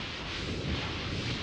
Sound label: Jellyfish Projectile Loop